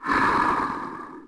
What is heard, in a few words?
Bear Heal Vox; Civ4 warlords